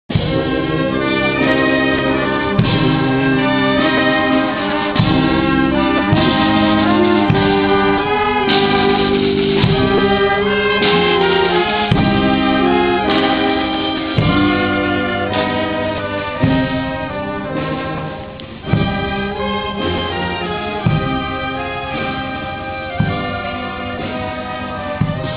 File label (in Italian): marcia funebre